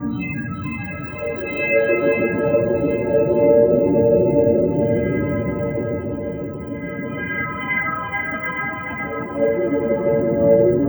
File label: Eeriescape, Underground UK House & Electro, Pads; Sonic Foundry (Sony Creative Software)